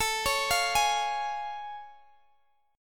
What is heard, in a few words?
A Augmented Major 7th A, Guitar Standard; arpeggiated; x,x,19,18,18,16; capo=2